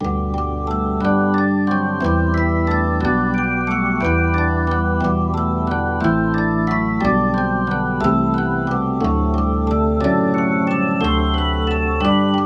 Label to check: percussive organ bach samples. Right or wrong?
Right